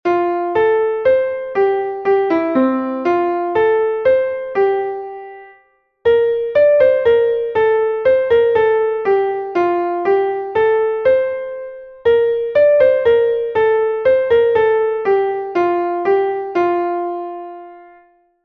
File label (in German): klavier; dat du min leevsten buest; melodiemeister